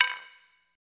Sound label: Staff Drop To Ground, spears & staffs